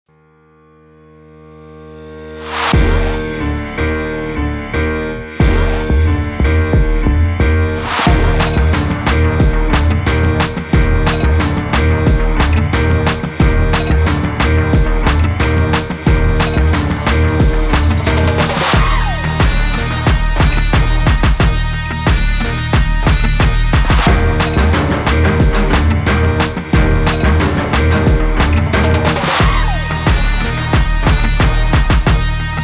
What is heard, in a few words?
Dark Trap